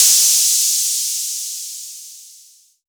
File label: crash noise, drum samples; Plots of five different audio recordings of drum samples